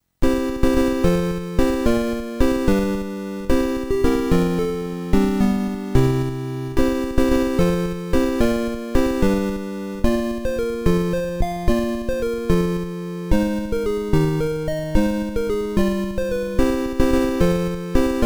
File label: tarantella; ultima 5 music